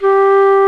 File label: WOODWINDS